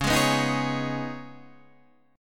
C sharp 7th Suspended 2nd

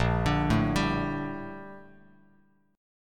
Bb Major 13th Bb Bass Standard; arpeggiated; JGuitar

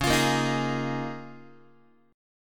C sharp 6th Add 9th C sharp down Guitar; strummed; C#6add9; JGuitar

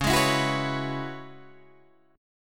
C sharp Minor 11th C sharp, Guitar DADGAD

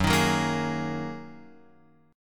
F sharp 6th Add 9th F sharp down Guitar Open F; strummed; JGuitar

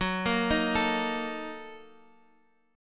Bb Major 7th Suspended 4th Sharp 5th

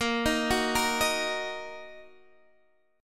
Bb Suspended 4th Sharp 5th down, Bbsus4#5 chord; Bbsus4#5 arpeggiated; JGuitar

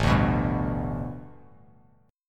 A 7th Suspended 2nd Suspended 4th Bass Standard; A7sus2sus4 strummed

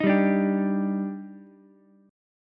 Eb Major 7th, Ukulele; EbM7; strummed; JGuitar

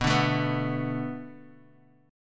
B Minor 7th B, Guitar; strummed; 4E,4A,5D,5G,5C,6F; 7,5,7,4,x,x

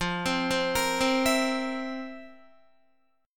F Major 7th Suspended 4th F, Guitar; FM7sus4 arpeggiated; JGuitar

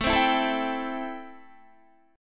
A-Minor 7th A Guitar; 17,15,14,14,16,14; strummed; Am7

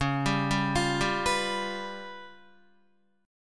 C sharp 7th Flat 5th, Guitar; arpeggiated; chordshape

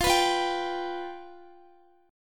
F Suspended 2nd Flat 5th, Guitar; Fsus2b5; strummed; x,x,17,16,17,15

